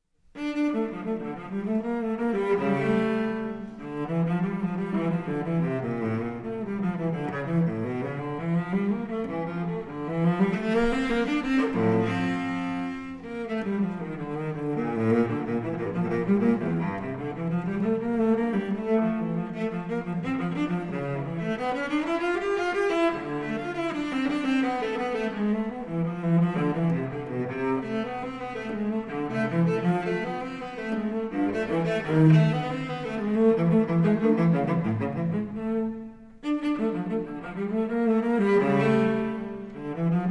Cello Suite in D Minor, Courante; Cello Suite No. 2; BWV 1008 III.

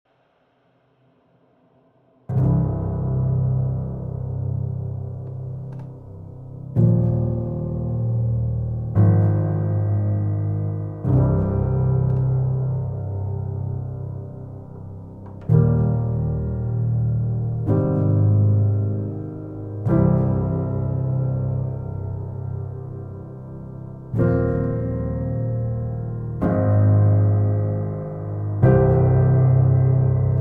Piano Reworks

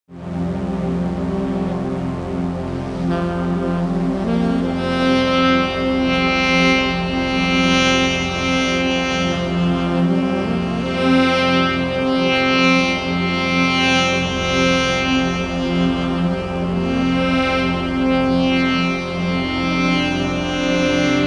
Sax

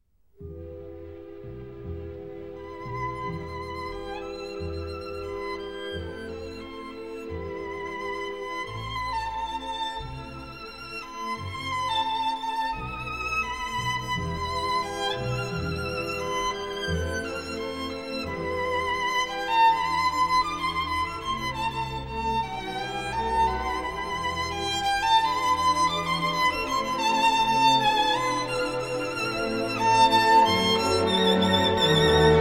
Violin Concerto in E Minor; Op. 64, MWV O 14; Mendelssohn

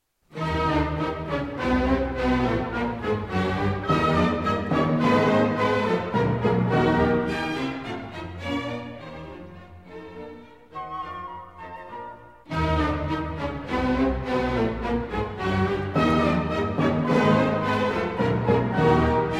Symphony No. 4 in C Minor; Schubert Symphony No. 4 in C Minor, D. 417 Tragic - II

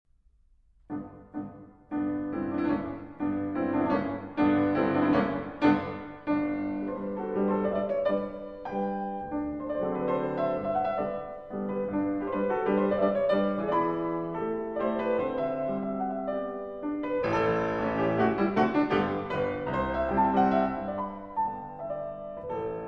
Mazurka In G; Chopin; Mazurka No. 44 in G Op. 67 No. 1

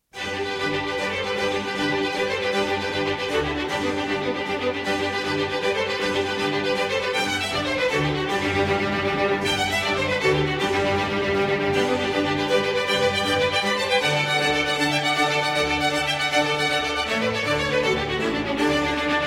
Concerto for Strings and Continuo in G major

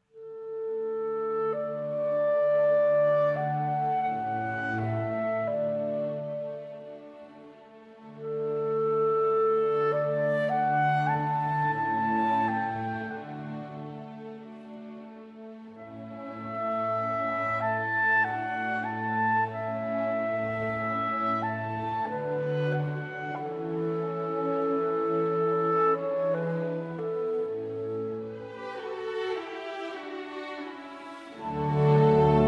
Clarinet Concerto in A Major, Adagio

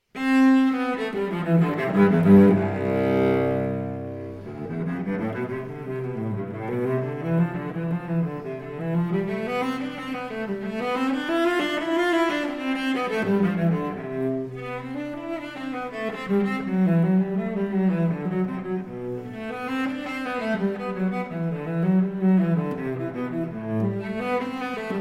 Cello Suite in C Major, Prélude; Cello Suite No. 3; BWV 1009 I.

